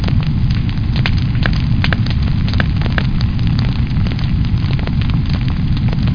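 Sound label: open fire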